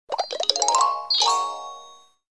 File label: android sms